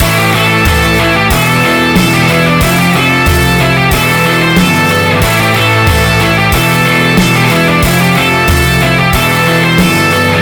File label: 4th shell IIIm